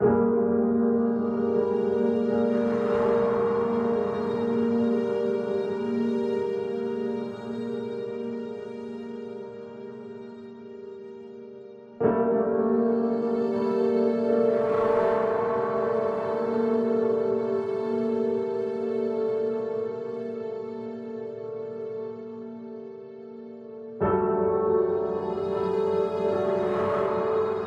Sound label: Mystery Ambience; unrest indigo